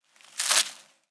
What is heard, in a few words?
pick ground; Immortal